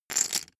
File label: poker chip stack rattle